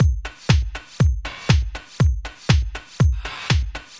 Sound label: Drum Loops, 120 BPM, Underground UK House & Electro; Sonic Foundry (Sony Creative Software)